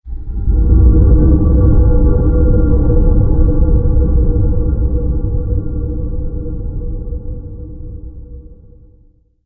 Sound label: Epic Scifi Horror, scary tone